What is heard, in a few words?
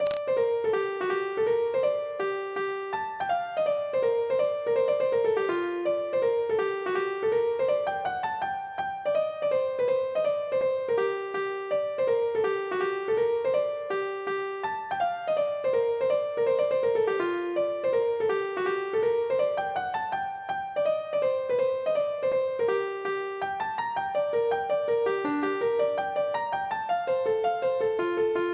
hornpipe; boeuf trad